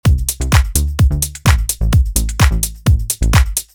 clap

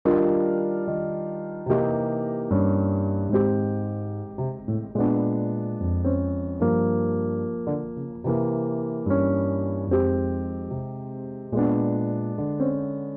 lofi piano

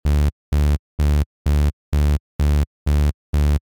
saw eq

